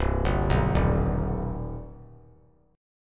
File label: C Minor Major 7th C 3C,3F,3A,3D; arpeggiated; JGuitar